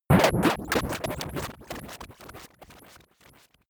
metal hit crazy

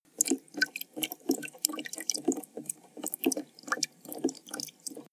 dribble small

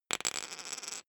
poker chip fall wobble

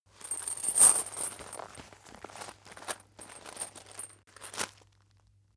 chain mail handling long

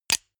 click

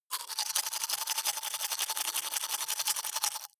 teeth brush dry open inward fast

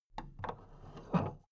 big plastic case handle take

